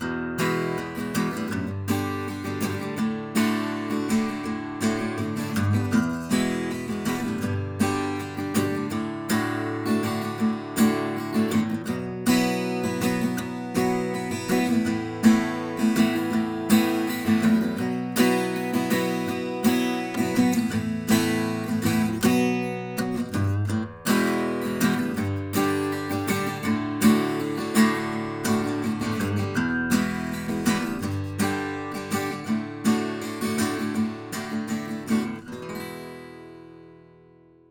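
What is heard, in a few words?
Acoustic Guitar Intercuts, KM84; KM84 Clone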